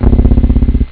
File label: juno, synth bass